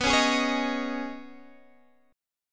D sharp 7th Suspended 4th Sharp 5th B, Guitar

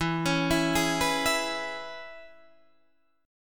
E 6th, down, Guitar, Open D

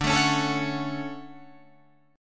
D Major 7th, Guitar, Drop D

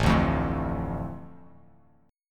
A Suspended 4th Sharp 5th down, chord; Asus4#5; strummed; JGuitar

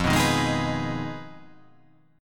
F Minor 7th Flat 5th F down

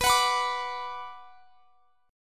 B Minor B; strummed

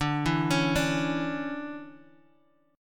D Major 7th Suspended 2nd, Bass Standard; arpeggiated; JGuitar

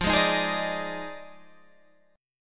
F Minor 13th, Guitar Open G

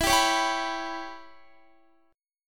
E Minor 6th E, Guitar; Em6; strummed; x,19,17,18,19,18

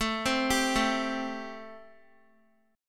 A Minor 7th A, Guitar; Am7; arpeggiated; chordshape

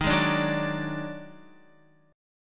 Eb Minor Major 7th Double Flat 5th Eb down Guitar; EbmM7bb5 chord; JGuitar

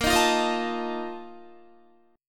Bb Major 7th Suspended 4th, Guitar; strummed; guitar chord; 17,18,17,20,17,17; JGuitar

